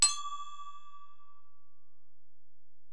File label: Bell, Alesis DM-PRO